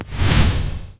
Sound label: big smooth explosion of s; smoky